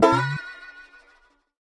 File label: Banjo; Samsung Galaxy S24